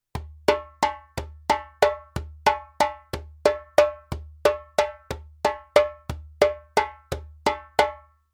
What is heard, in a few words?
djembe; djembebog oevelse